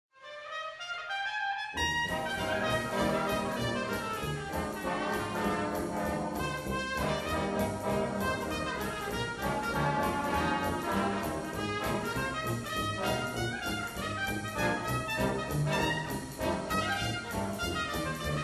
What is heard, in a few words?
swingtime